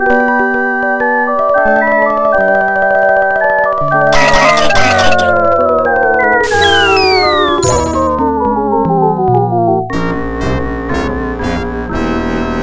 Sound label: adlib